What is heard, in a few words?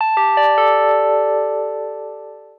sms received; Samsung SGH-i450v; Digital